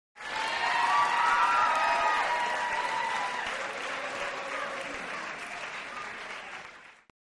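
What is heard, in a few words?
Crowd 100 Interior Cheers; medium int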